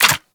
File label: Hack Minigame Select; Entrati